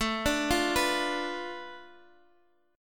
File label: F 6th Flat 5th A, chord